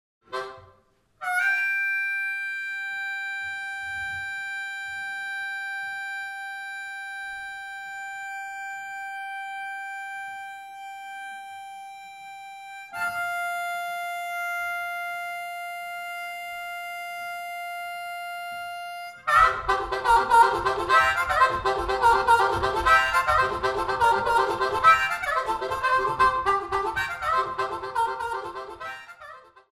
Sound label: Folk Music